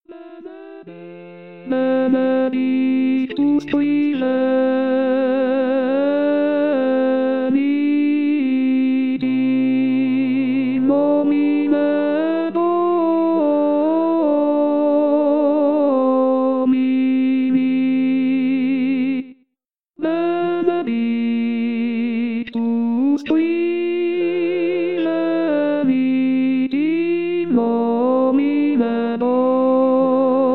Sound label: Benedictus Tenori; Pasqua